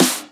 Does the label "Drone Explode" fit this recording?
No